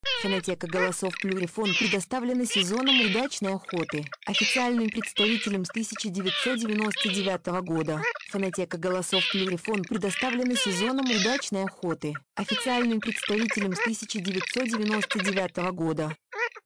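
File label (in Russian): чирок-трескунок, манок; plurifon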